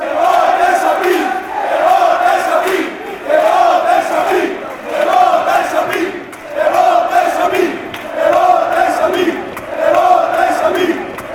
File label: you jump fanchants